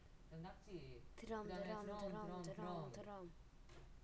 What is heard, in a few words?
drum